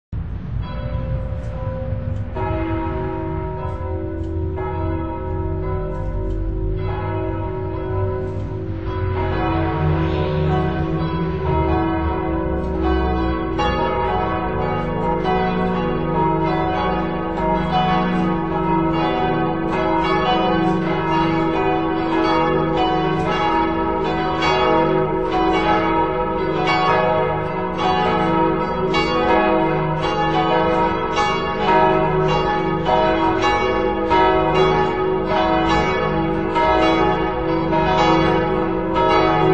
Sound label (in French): volee; jumet